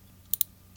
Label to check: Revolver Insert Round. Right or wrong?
Right